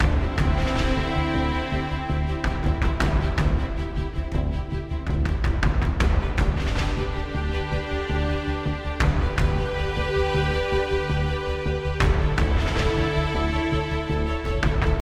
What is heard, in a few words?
Mood Mid